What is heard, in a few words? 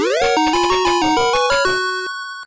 Music Unused Jingle; Sonic Chaos; SMS